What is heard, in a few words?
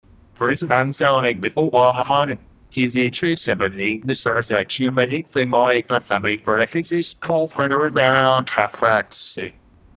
brief jingle; aviation